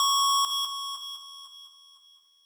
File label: lead